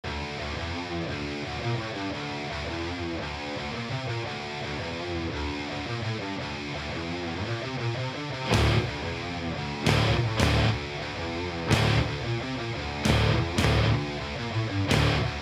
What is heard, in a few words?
Metal